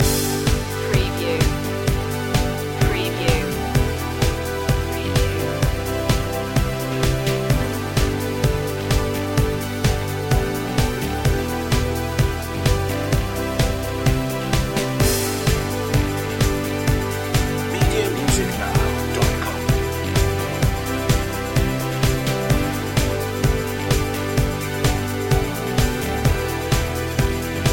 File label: ultraviolet loop